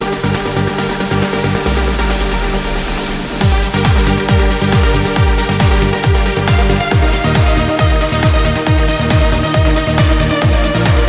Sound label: Trance